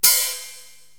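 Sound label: Hi Hat Foot Open; Korg TR-Rack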